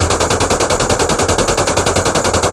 machinegun mono loop; Weapon-Explosion

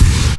gunshot heavy; Weapon-Explosion

